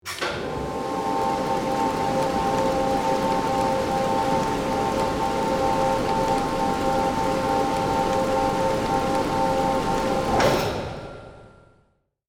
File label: Ship winch cranking retracting